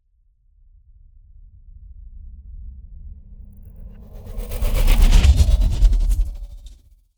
transitions sci fi movement; SoundBits

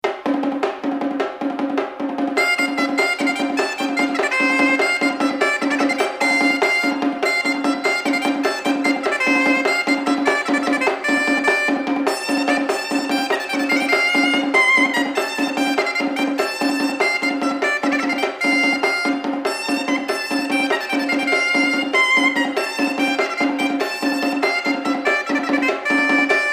Estampie Real; La Quinte

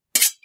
sword Metal; Foley; Kenney's Sound Pack

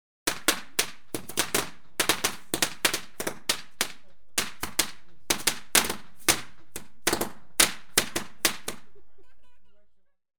Fireworks, Chinese Fire Cracker; BBC Sound Effects Library; Explosions Guns Alarms

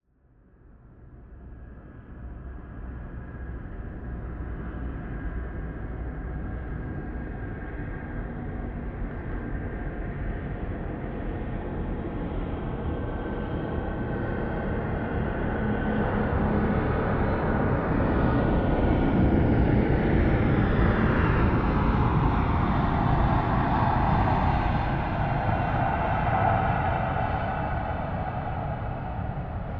Plane Passbys window; Fox Audio Post-Production; Interior-Exterior

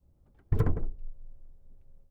Ford Focus RS Hood Pop Open Engine; 2016; MKH8020; Pole Position

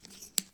chips Handle, Casino; Kenney's Sound Pack

